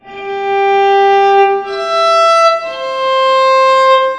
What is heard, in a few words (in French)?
timbr; MOBICLIC 14; quiz